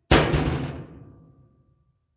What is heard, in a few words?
Slam Washer Shut, Clothes washer lid is slammed shut.; Laundry Sound Effects; Household